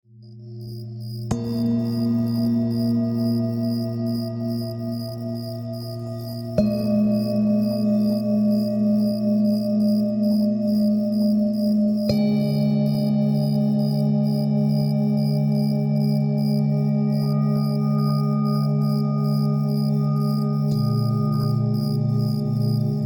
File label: Tibetan Nocturne